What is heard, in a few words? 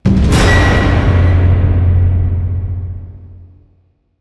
Orchestra Hit